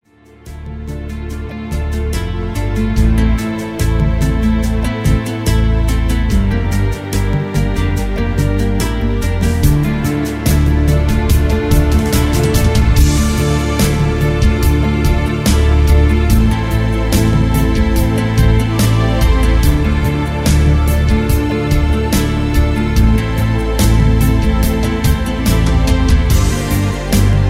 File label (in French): instrumental